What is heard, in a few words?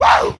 "woo"